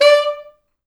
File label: D4, Pop Brass; Best Service ProSamples vol.19; AKAI